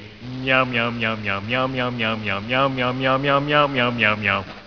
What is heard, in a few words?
meow; Meow, meow, meow etc.; Simpsons